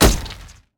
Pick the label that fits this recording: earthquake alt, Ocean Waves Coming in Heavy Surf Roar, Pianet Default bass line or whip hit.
whip hit